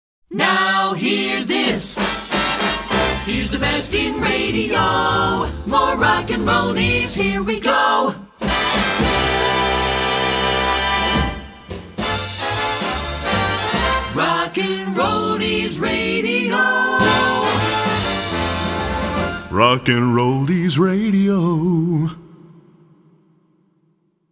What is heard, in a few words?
Jingle; Short Attention Jingle Show IV